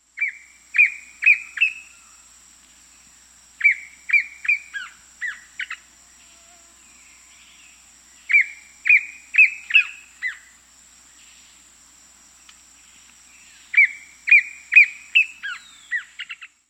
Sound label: Black Antbird; S. America